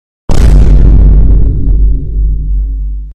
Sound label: vine boom